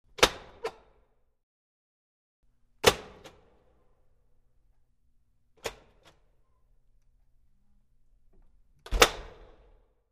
The metal cabinet door opens, closes

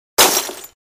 glass breaking

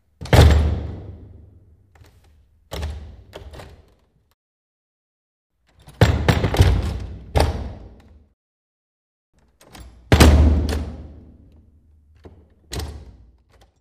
Wooden door slams shut

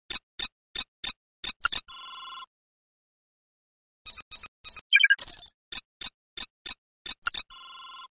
information read signal; radio signals

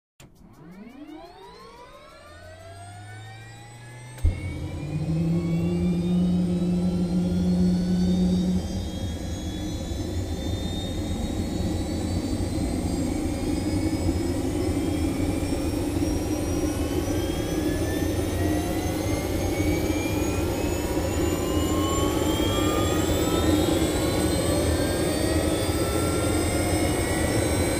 Helicopter starts and takes off inside

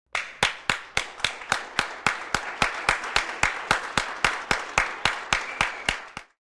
Applause in a small room; standing ovation

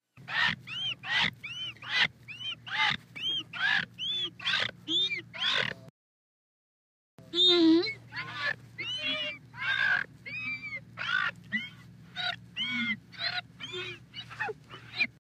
Glass friction creak

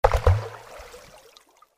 stone falls into the water

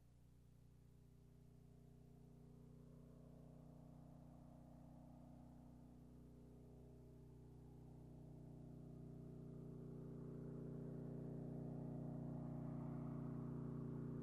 Motorcycle passes by

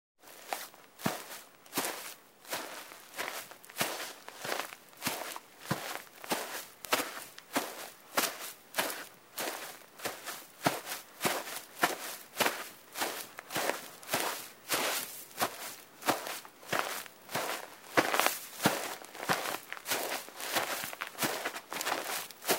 Footsteps on the grass

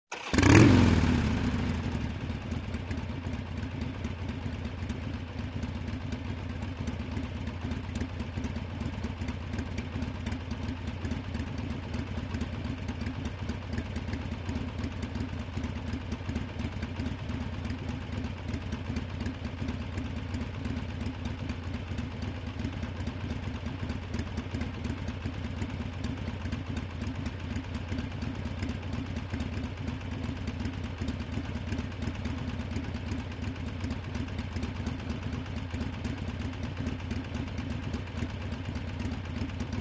Motorcycle starts, waits, turns off

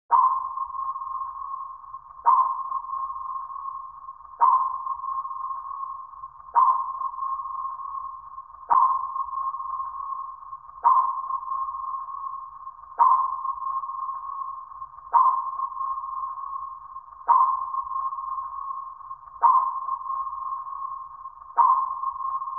Submarine sonar

